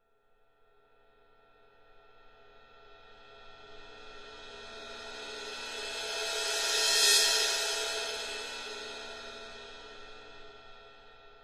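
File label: Cymbal Thin Crescendo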